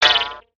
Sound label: Frog Hatchling Hop Jump; creatures organic